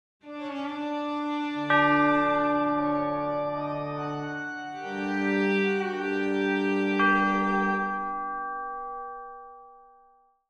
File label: Video game success victory win dark